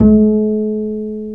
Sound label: Bass, Roland